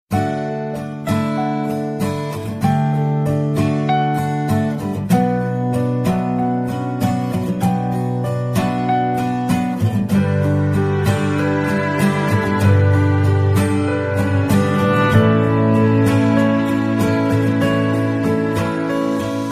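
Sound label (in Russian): чувственная музыка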